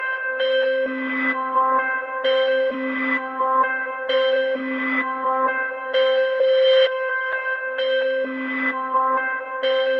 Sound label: phone call; cyberpunk 2077